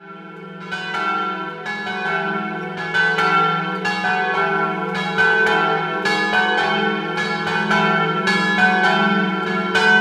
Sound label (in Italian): Orcenico Superiore, campane